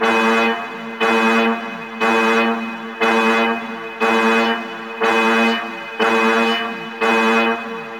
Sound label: Egg Alarm Loop; Warframe; Tau; World Seeder Descent